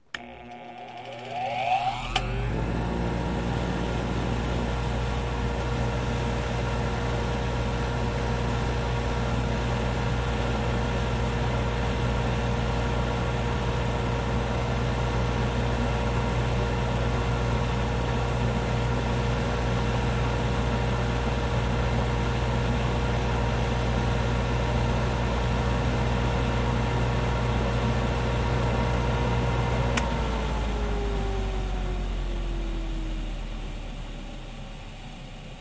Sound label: Machine Wind Updown, variable speed